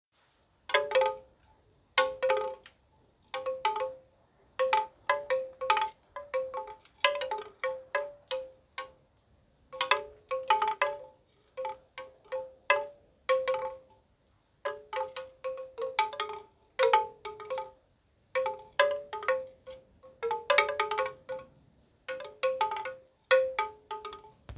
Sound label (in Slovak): 4 large, bambusova zvonkohra 4 tuby velka